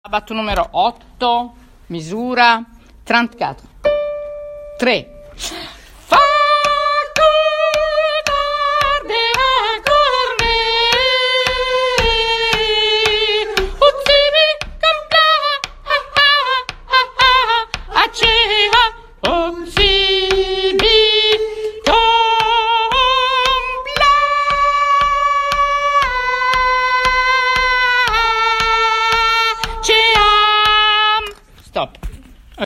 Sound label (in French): soprano rapide; Mesures 34 à 45; Stabat Mater 8 Rapide